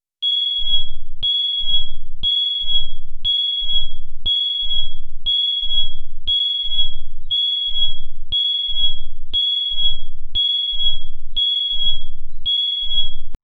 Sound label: an alarm on a spaceship